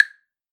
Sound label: metronome